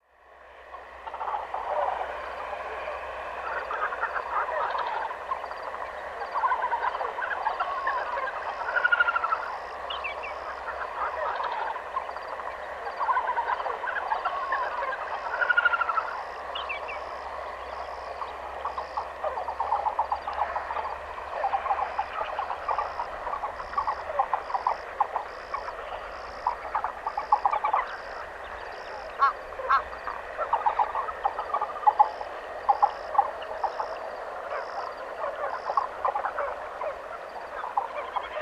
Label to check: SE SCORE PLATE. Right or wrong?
Wrong